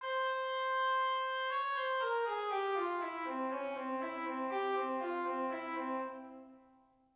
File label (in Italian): Fuga; Fuga n. 2 originale